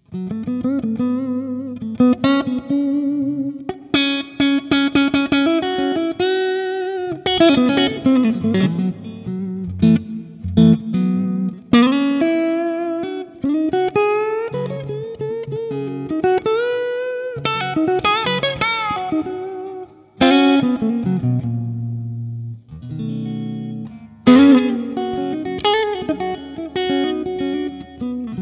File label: german 59 bass ir test